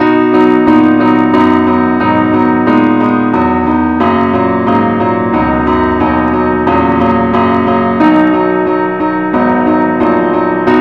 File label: keyboard only key B